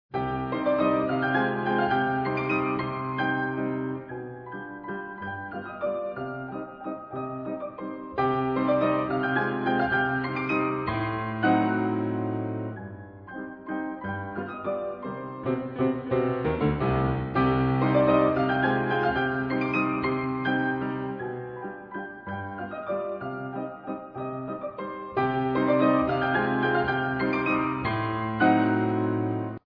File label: PIANO WORKS Waltzes; SMETANA; KOMPLETNI KLAVIRNI DILO B SMETANY; Walzer